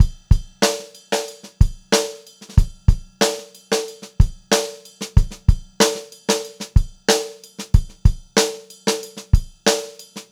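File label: STRAIGHT VARIATION, Funk-Groove Drumloops; USB Soundscan vol.38; AKAI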